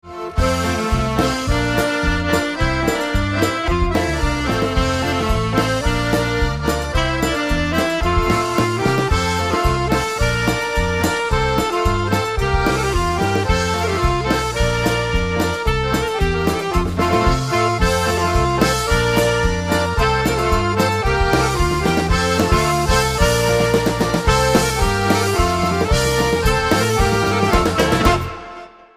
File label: Polka